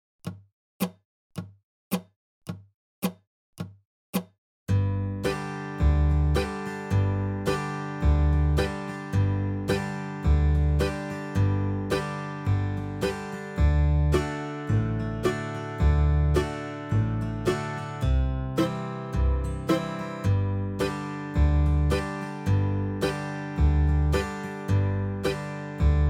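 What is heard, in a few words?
Key of G 54 BPM, Dobro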